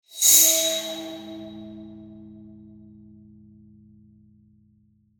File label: Cinematic whoosh transition